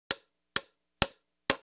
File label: HAMMER A